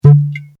Glass Liqueur Bottle Cork Opened; Bottles; Bobby Cole

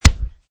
Chest Punch

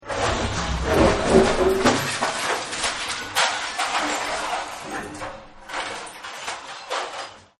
Everything Must Go, Crunches; Crunch Sound Effects; Objects

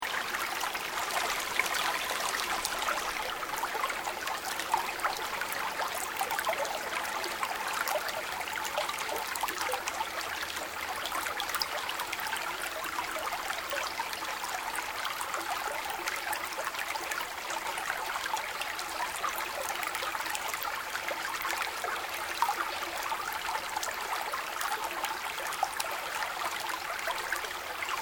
Water Stream Gentle Loop; Elemental weather effects; Nature

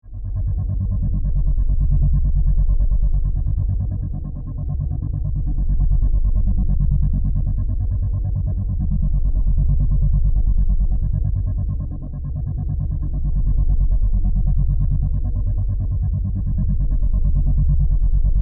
Drone Flutter Energy, Pulse, Scifi; Science, Fiction

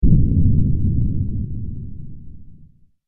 Dinosaur Mighty Footstep

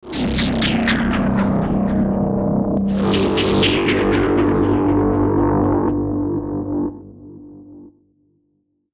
Descend Dark Rhythmic Delay; Descending Sound Effects; Descends (Sound Effects:Sound Design); Blastwave

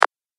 Analog Click

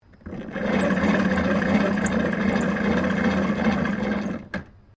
Telephone Old Wallphone Crank Operating; Various old telephones; Electronics

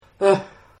Groan; Groaning; Voice; Partners In Rhyme

